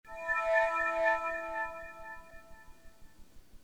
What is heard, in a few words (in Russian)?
levitata, zvuki telefona blackberry bold 9700